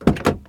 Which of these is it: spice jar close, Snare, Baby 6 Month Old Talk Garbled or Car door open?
Car door open